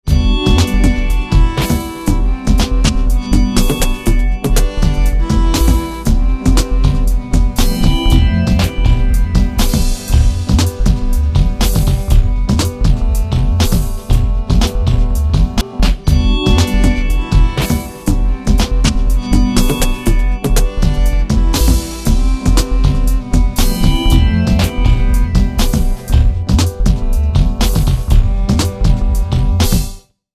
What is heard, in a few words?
violins